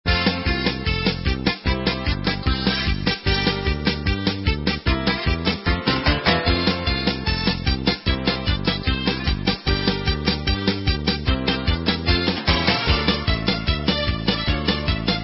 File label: Instrumental